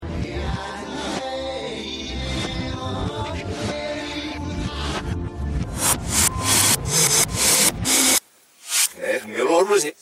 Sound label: rolling pin; reverse